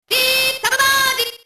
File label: deep submerge